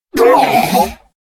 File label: death, player